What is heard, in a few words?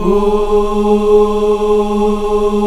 AFROOO G#3, AF.VOWELS; Spectrasonics; Heart of Africa 1